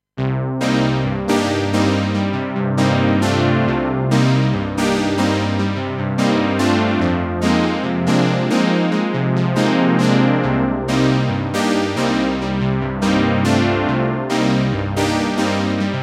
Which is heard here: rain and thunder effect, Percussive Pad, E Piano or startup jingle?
Percussive Pad